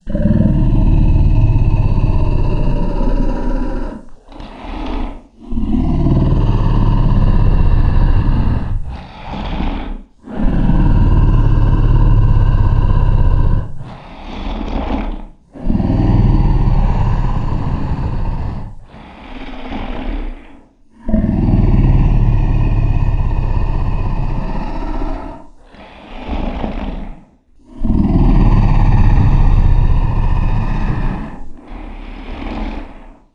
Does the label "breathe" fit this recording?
Yes